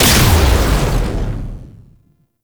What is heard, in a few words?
Lightning Gun Shot; Caliban Prime Boss; Warframes